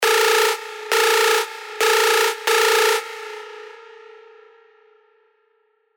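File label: lead, Uh Ohh, Sylenth1; alonso fireworks stand 1776 edition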